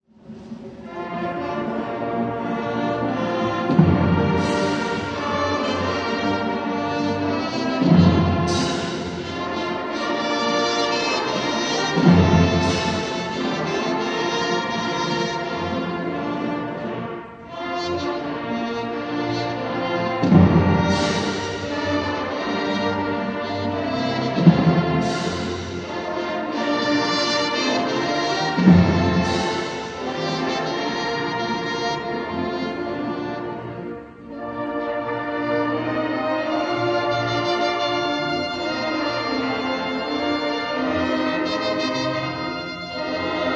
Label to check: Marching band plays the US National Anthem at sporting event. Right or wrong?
Right